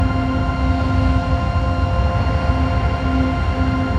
Whoosh Loop; TIA

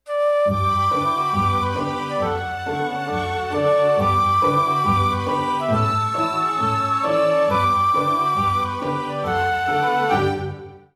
Emotional Baggage Theme; psychonauts prototype

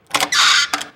Jail Cell Door Maximum Security Open

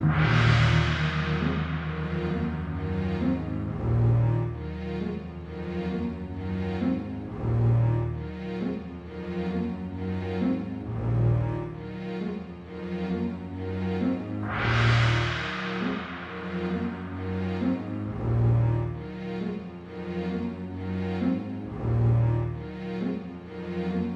Melody; PixelJunk Shooter; PS3

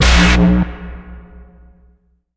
Music

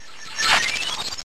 Shields Down; pokemon reborn e19 2022

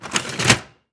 SFX Open Tray; PC Building Simulator

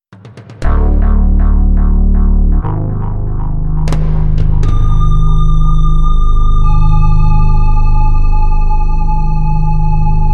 Music One Shot

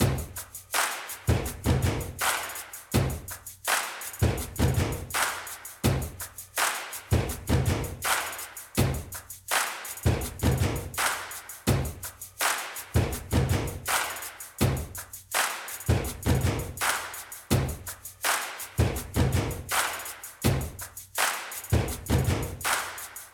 Dance Music Stage; barbie dreamhouse adventures

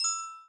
Successful Hit; Blockman Go; Android

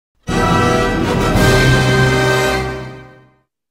Stinger Spawn

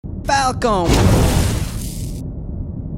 Falcon Kick; Infiltrating The Airship

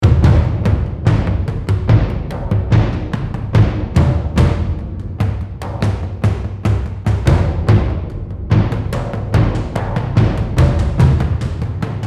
Perc Build; 30 Seconds Left

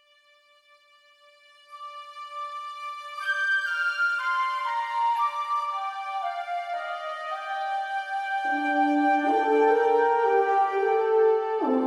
Mysterious Jungle Open (Cinematic); madagascar

